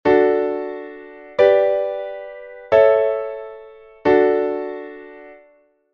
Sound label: progression, secondary dominants